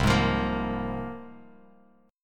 C 7th Suspended 4th Sharp 5th, Bass Standard; C7sus4#5 strummed

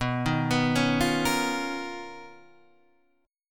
B Major Sharp 11th

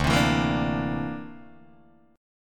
D 7th Suspended 2nd Sharp 5th D, Guitar DADGAD

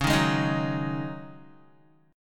Db Suspended 2nd Suspended 4th Db Guitar; 8,6,8,8,6,x; 4F,4A,5C,5G,5C,6E; strummed

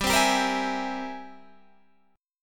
G sharp Augmented Major 9th G, guitar

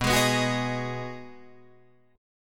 B 6th B down Guitar Drop D

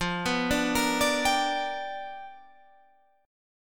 G 7th Sharp 9th F chord

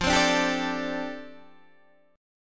F 6th Add 9th F down Guitar Open D, chord

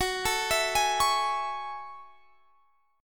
F sharp Minor 9th F sharp

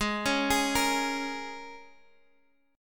Bb Minor 7th Ab

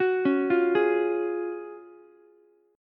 Gb Major 7th Suspended 2nd, Ukulele; GbM7sus2 arpeggiated; JGuitar

